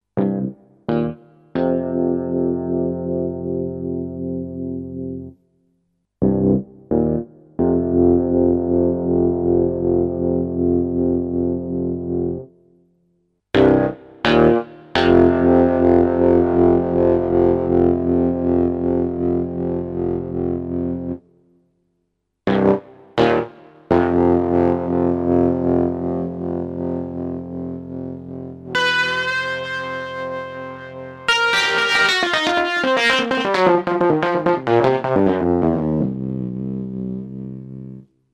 Teenage Engineering TX 6 Distortion

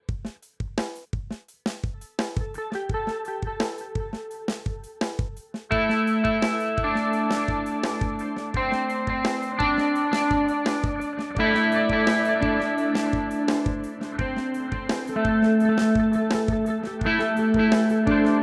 Zoom G11 Modern

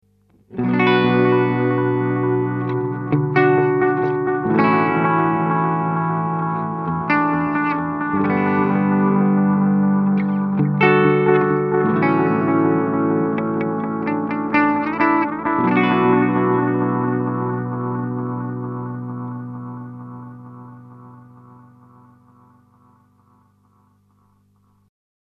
ADMini Analog Delay Sound 2 o'clock setting; Ibanez

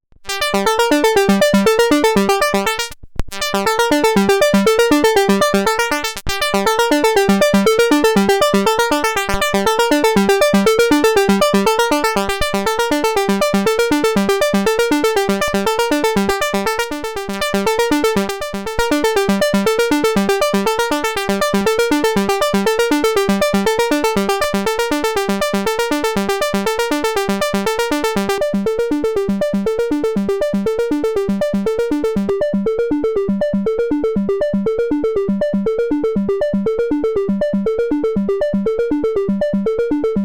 Sequence Waveforms

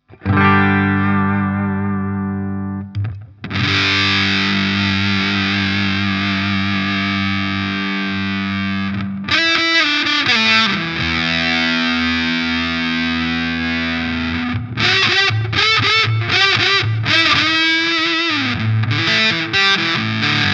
Big Muff Sustain Tone; Rams Head

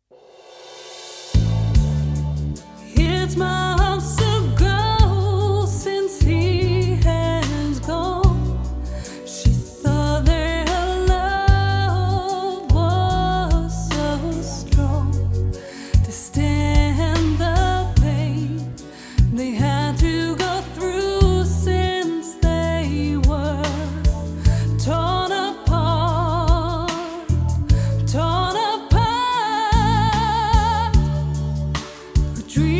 Reverb UAD Lexicon 224

